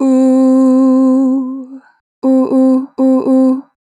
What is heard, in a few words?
Ooo - 2 bar vibrato, Vocals, Natural Vibrato; Harmor Vocals